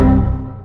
error soundss

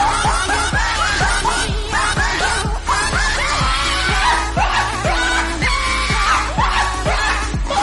laugh cry; kwebbelkop

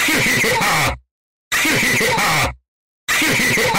heheheha

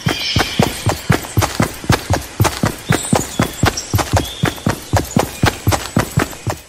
running fortnite

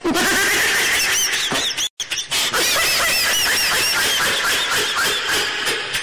baby launghing bass boosted

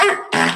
bark fart combo

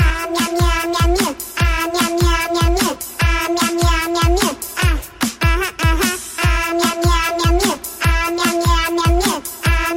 meow meow

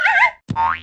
whirly slip and fall

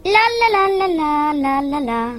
three singing; numberjack

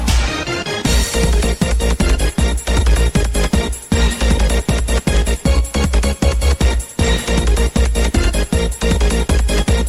song

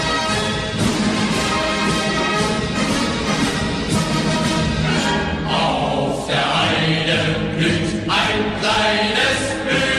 german march song

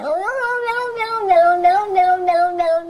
nononono cat

